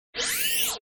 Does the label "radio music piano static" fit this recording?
No